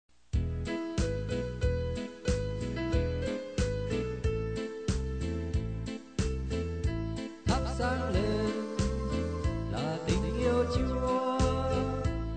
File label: bass